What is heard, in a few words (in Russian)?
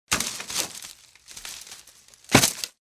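удары о корзину во время загрузки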